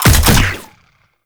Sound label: Ink Rifle Alt Shot, Weapons; Warframe; Tenno